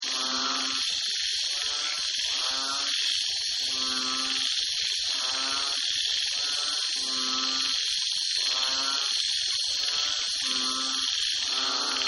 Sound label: Jungle Night Swamp; Nature Scenes; Forests and Jungles; Partners In Rhyme